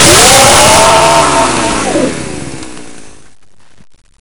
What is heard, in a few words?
chainsawed